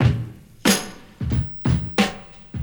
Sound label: market, Drum loops Boombap